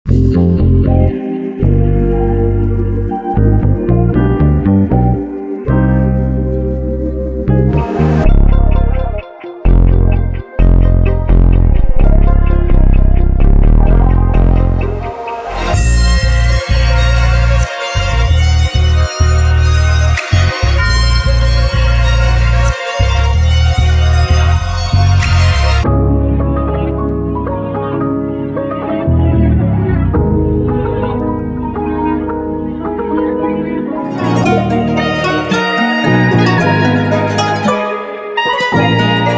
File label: rnb and trap; seshnolan